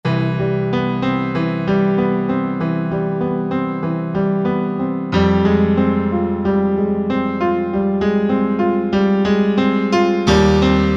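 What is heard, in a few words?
128 voice Piano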